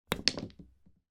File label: sport miniture snooker pool ball hit with cue strike other ball